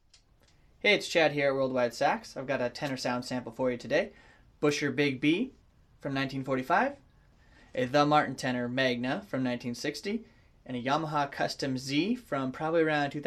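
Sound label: Tenors Big B Magna Yamaha Custom Z, tenor saxophone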